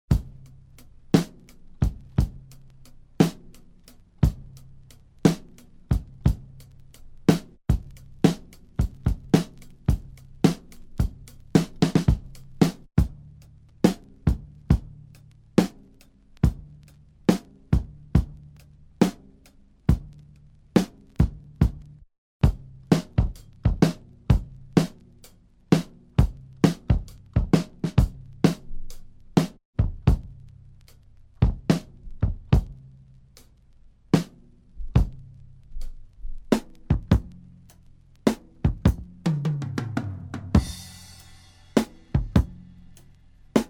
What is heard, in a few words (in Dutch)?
slagwerk, drum; Dutch album, drumbreaks only ! Killer drum kits for hip hop !